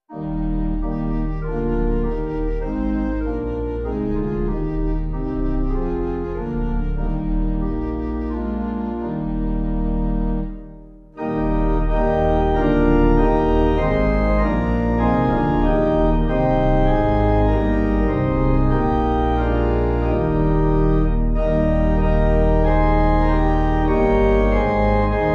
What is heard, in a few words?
Pipe